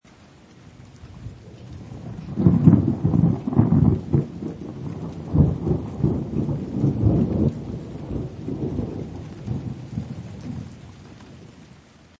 Rain And Thunder Loop, Rain with thunder, seamlessly looping sound; Rain Sound Effects; Weather; Lynne Music